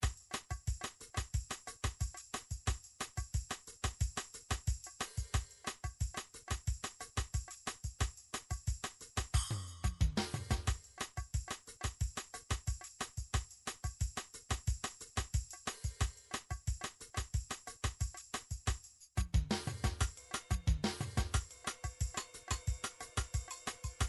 drum samba

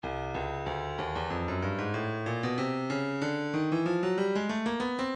stylus breakbeat midi 094 bpm; Vinyl Analysis

